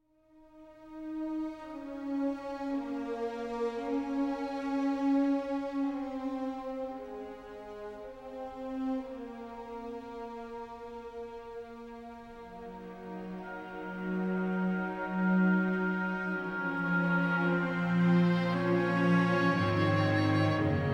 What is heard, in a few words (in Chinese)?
广告片音乐